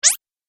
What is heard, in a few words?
Arcade Game Slide Squeak; Video Game Sound Effects; Retro Inspired Sound Effects - Think Nintendo, Atari, C64, Etc.; Andrew Fleming